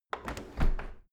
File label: window handle close; objekte; fenster